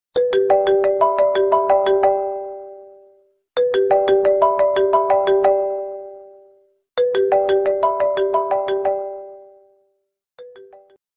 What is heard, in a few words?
samsung galaxy a72 original ringtone